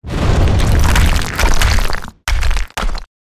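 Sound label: ancient altar death, monster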